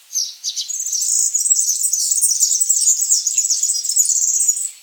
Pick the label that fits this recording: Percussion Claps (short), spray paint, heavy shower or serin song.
serin song